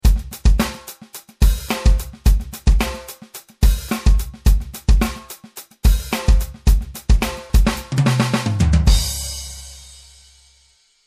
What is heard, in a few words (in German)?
Akustik Set